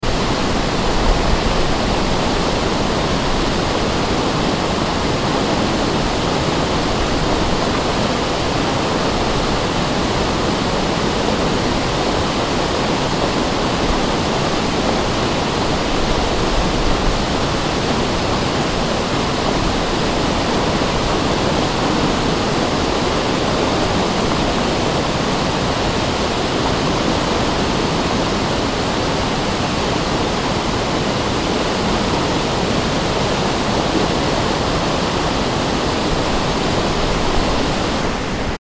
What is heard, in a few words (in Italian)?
ponte; Forbato Leno